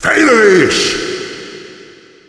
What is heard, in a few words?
headshot